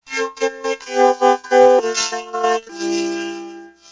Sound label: sing, vocoder